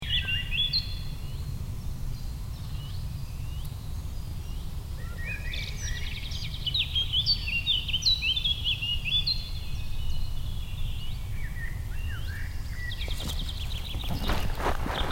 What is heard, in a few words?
liepnitzsee soundscape